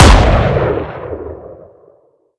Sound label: weapons; Valorant; cstrike; guardian